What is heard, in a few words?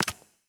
reel Bounce; netent